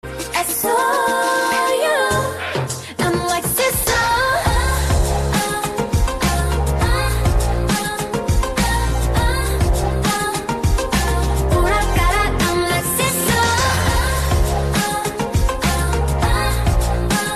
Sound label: Chorus